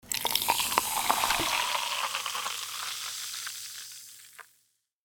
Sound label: Fizzing soda pour bubbles cola; liquid